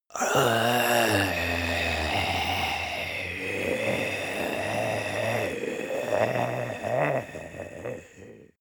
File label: zombie male exhale throat